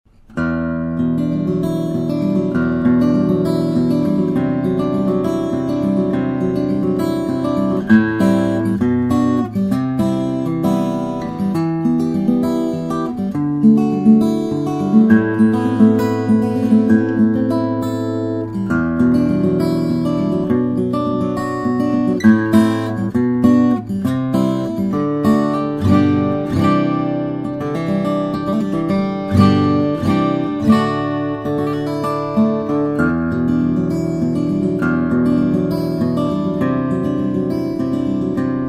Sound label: steel string guitars, flattop; forster s om